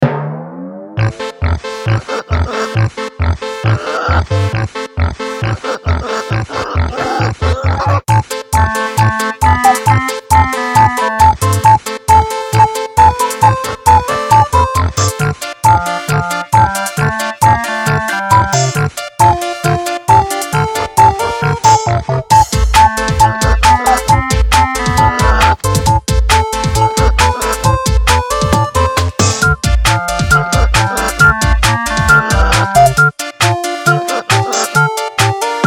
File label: Intro loop